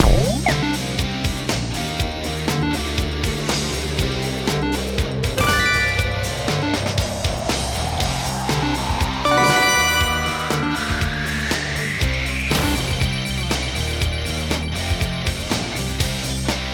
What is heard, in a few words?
megawin rock; Wazdan